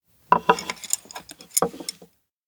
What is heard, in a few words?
miso hold bowls two; anemoi